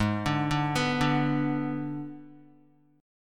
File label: Ab Diminished, Guitar; Abdim arpeggiated; JGuitar